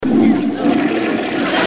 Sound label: flush